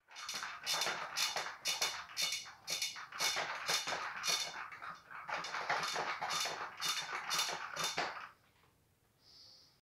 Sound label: baby carriage; کالسکه کودک